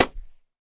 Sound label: Boxing Glove Hit Slap